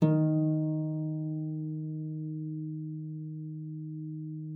HFEM D3, HARP; Xsample VOL-8; HARP MF

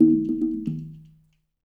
WHALE; Spectrasonics; Liquid Grooves 1 & 2

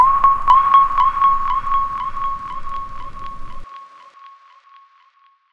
Dubby Blip; Zero-G Sound Sense Grime Scene; 90 s Sample CDs

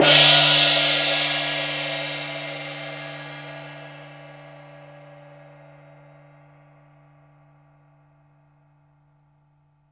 CYM SML, Orchestral Perc; Roland LCDP03

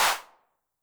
HAND CLAP Reverb Pitch Width, 909

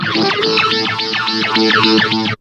SIREN, Funk Guitar; 90 s Sample CDs; Zero G

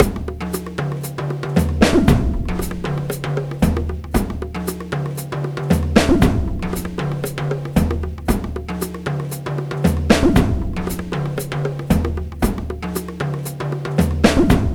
drm 116, Breakbeat, 116bpm; Breakbeat 2; Rodent Power

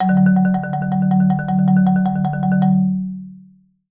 Marimba roll F#2; Sampleheads - Dave Samuels Marimba & Vibes

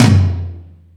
Toms, E-MU Pro-Cussion

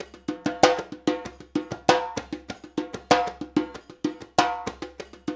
percussion loop

